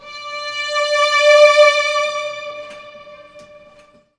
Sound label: STRG, Roots Disco; USB Soundscan vol.32; AKAI